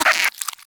Vocal Tranq Grunt; Warframe; Hunting Critters; Nexifera

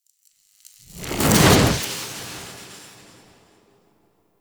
Dash; Parking Group; Volt; Warframe; Cinematics; Hub

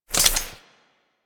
Auto Mode Switch, Weapons; Warframe; Corpus; UMP; Tetra

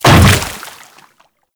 Hammer Boss Step Water, Footsteps; Warframe; Grineer Hammer Boss

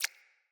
Rat Water Step

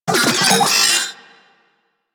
Vocal Alerted; Warframe; Corpus; Mini Moa

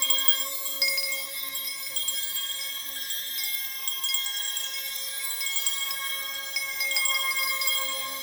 Egg Loop; Edens Ultimatum; Ayatan

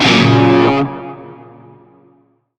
Shawzin Hirajoshi Chord J; Music; Warframe; Narmer

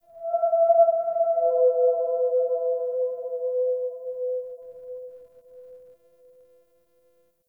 Sentient Lair Texture Interior Small, Ambience

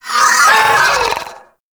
Vocal Pain; Warframe; Sentients; Ortholyst

